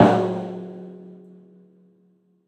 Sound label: Tom Big; One Shots; Drums